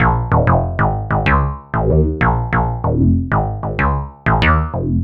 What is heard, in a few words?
Bassline; Drums & Drones